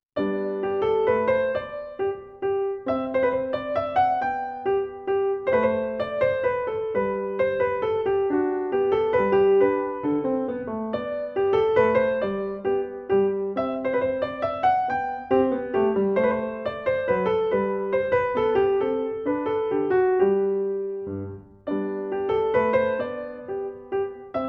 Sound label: Minuet in G Major